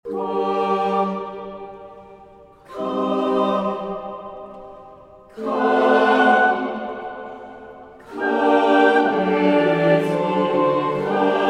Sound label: English Sample